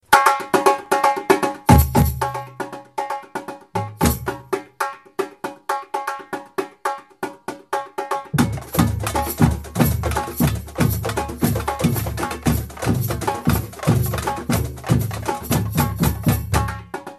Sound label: Baiao Intro